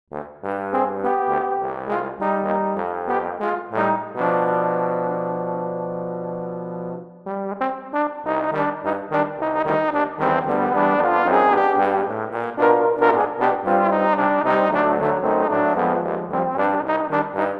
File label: Trombone Quartet; Humoresque for Trombone Quartet